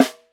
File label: clean snare single hit c key; tuned drums c key